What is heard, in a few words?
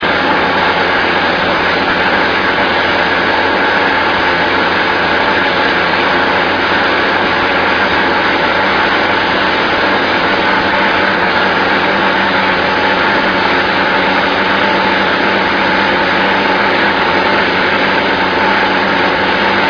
pulsejet